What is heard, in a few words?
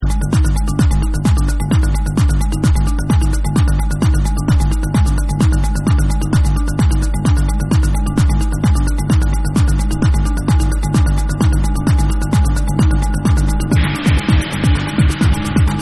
DARK STRINGS